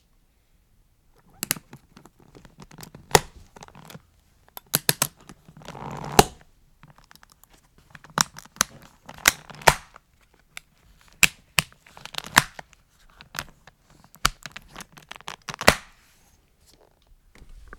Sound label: Skiboots